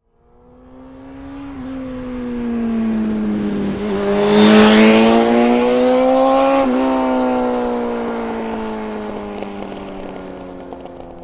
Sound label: transport motorcycle honda hornet race bike pass fast; fork media